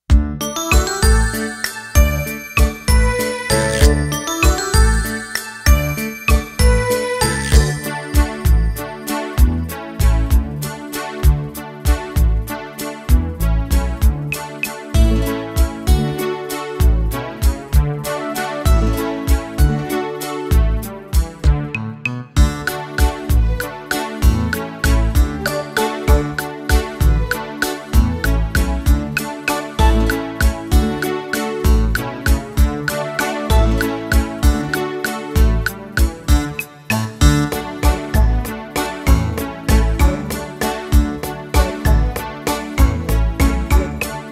instrumental

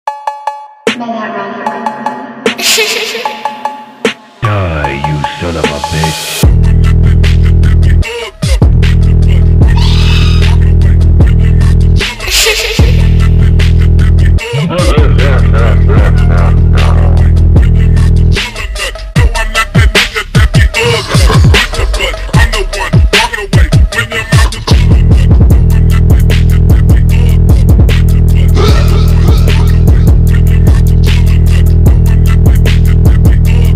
phonk

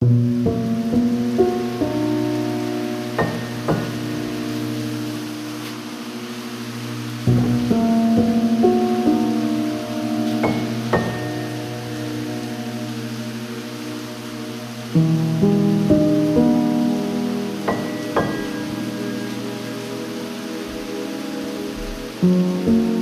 slowed

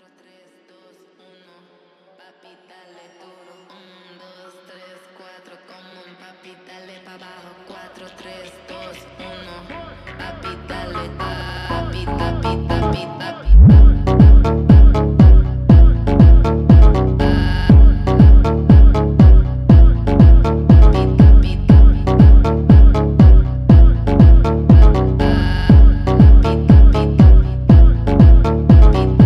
phonk

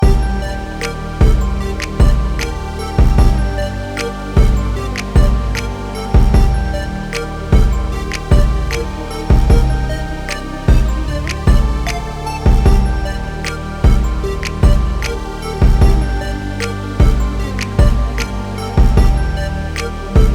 instrumental slowed

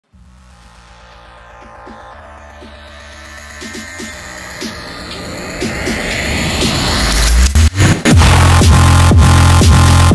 fonk speed up

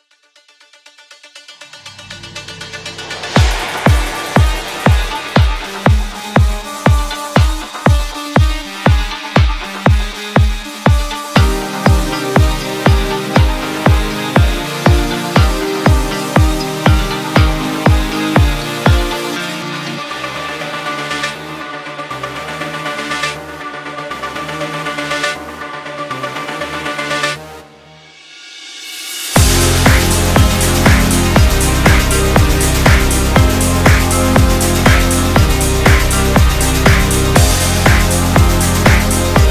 instrumental

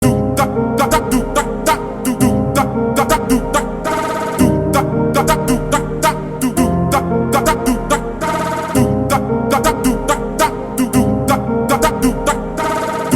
love funk super slowed

